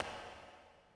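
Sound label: echo run, footsteps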